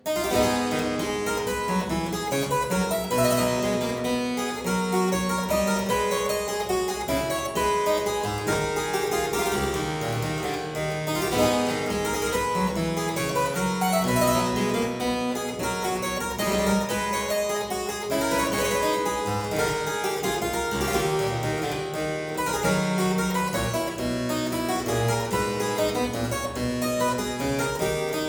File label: Courante in A minor - Variatio, harpsichord